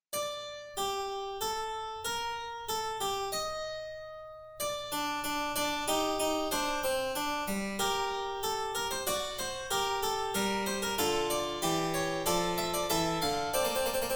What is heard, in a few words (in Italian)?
Fuga In Sol Minore; Kafka; Handel; Ludwig van Beethoven